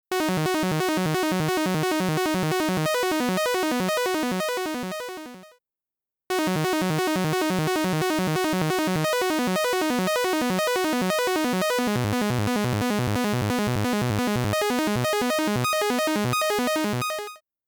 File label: Wider Sound Arp